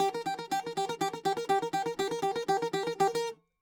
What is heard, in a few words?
bouzouki